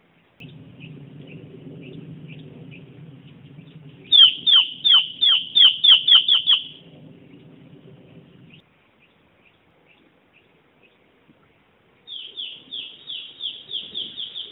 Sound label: antbird bare crown; Reclamo; Arenal; Gymnocichla nudiceps; Bare-crowned Antbird; Hormiguero Calvo; costa rica; grabado por Miguel Rouco